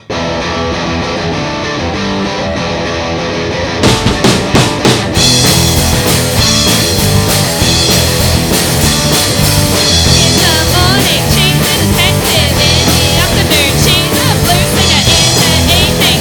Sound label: Harmonica